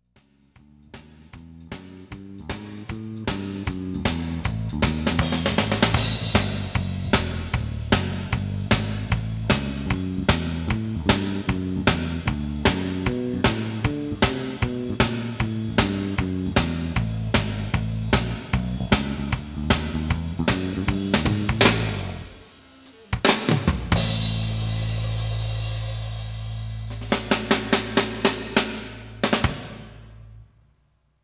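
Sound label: reverb small hall; audio examples from the book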